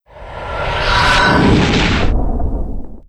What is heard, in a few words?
throw knife; Modern Warfare